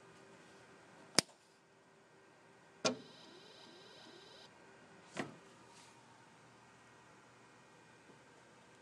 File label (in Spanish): encendiendo maquina